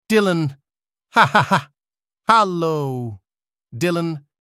haha

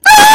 scream

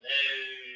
whoaaaaaa

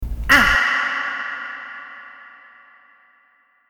aa with reverb

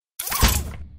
moving

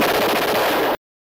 ak 47 shoot

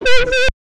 woop woop, siren

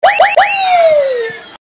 zoom out

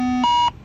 warning tones

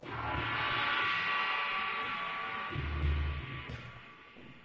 gong

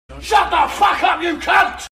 shhhhh

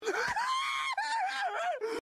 screaming; muscle man

